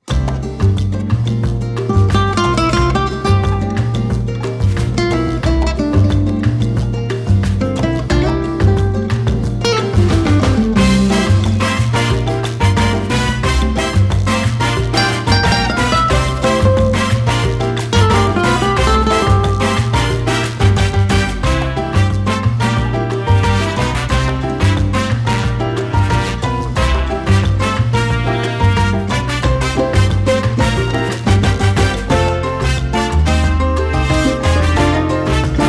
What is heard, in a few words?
Mambo Instrumental